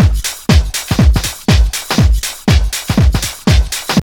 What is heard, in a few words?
house loop